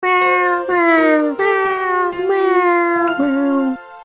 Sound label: mew mew mew